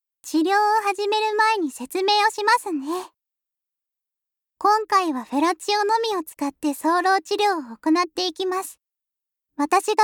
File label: Licking, ASMR